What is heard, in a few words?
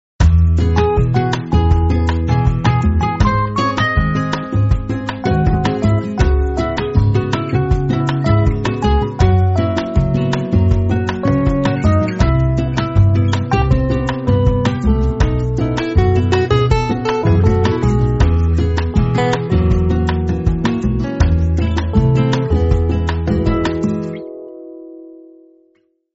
Nokia Cafe Lento